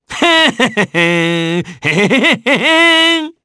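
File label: Vox Hum, Japanese; kingsraid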